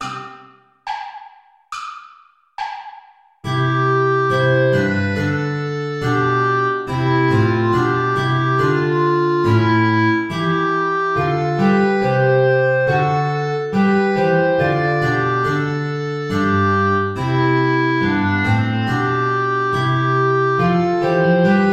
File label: play along without guitar